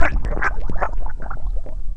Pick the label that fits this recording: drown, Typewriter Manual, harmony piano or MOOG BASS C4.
drown